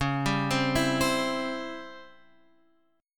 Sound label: Db Minor Major 7th Flat 5th Db down Guitar Open F; DbmM7b5 arpeggiated; JGuitar